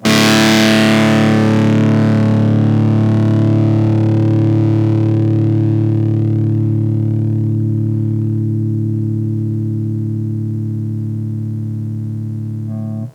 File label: Blues Open A3, Blues Guitar